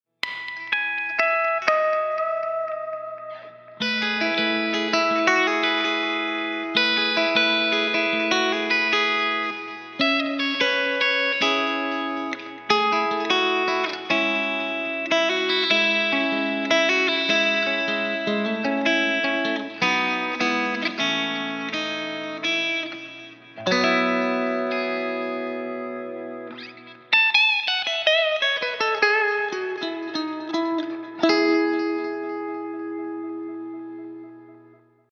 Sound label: Bridge Clean